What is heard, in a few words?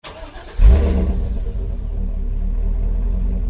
startup